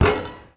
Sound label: Street Ball Glass Rim; SFX Kit; Street Basketball